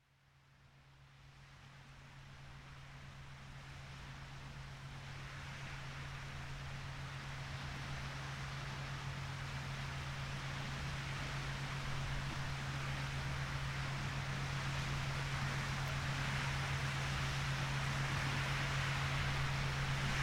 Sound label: Ocean waves with Schumann resonance